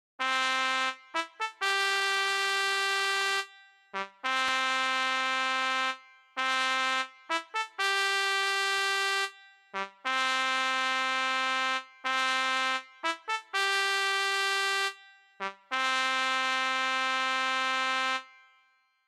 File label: keys; lagaan bgm